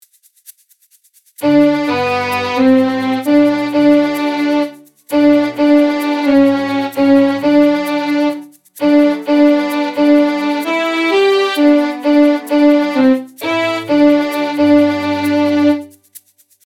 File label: we wish; Takt 18 - 25; Good tidings...